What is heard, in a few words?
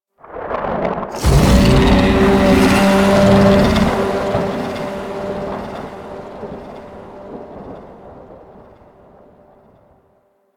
Infested Micro Planet Sun Monster Bellow; Warframe